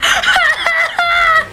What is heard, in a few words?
Laugh; Mori Calliope